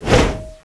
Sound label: razor knife slash